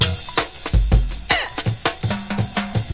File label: break, 164 bpm; 161 to 180 bpm